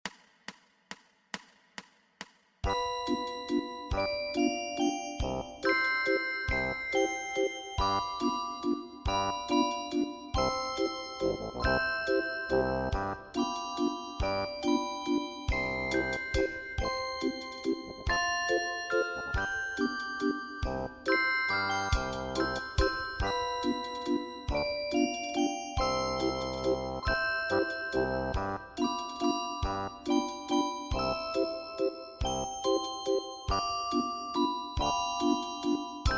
Chopsticks Accomp

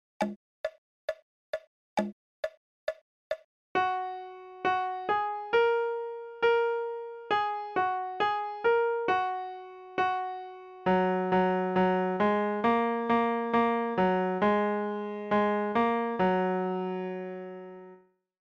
Down By Station

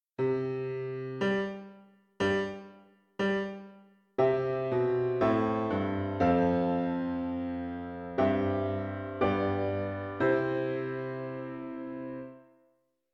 Piano

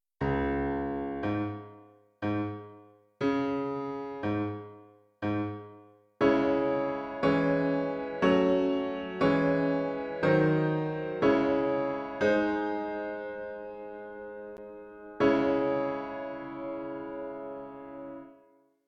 Piano

